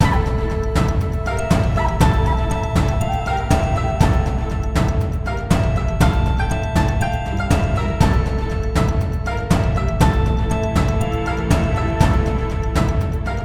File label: BGM Loop; hidden dragon legend